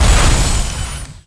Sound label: explode cryo; SAS Zombie Assault 4; Android